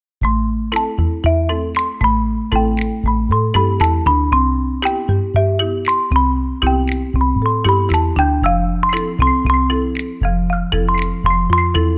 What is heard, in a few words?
Marimba